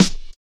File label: combi drums